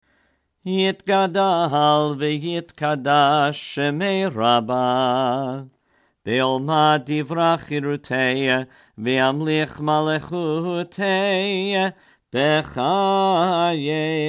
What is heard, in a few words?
hatzi kaddish; siddur; Shabbat